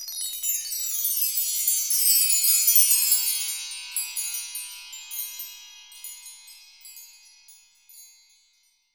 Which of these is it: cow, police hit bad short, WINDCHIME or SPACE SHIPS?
WINDCHIME